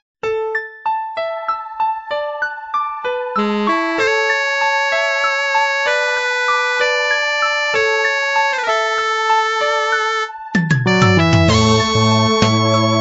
polyfonisia

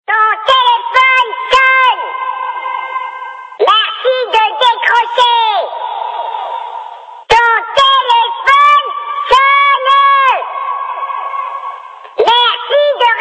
ota puhelimesi

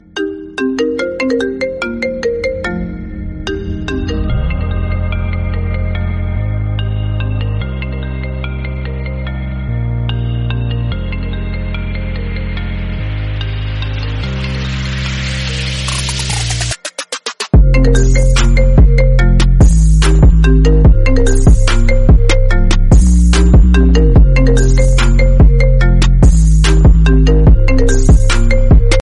siisti iphone 11

